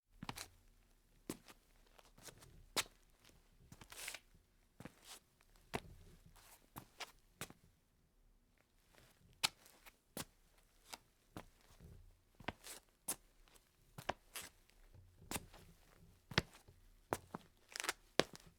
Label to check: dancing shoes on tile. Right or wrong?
Right